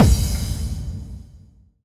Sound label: BD-FX, Dance Mega Drums; Best Service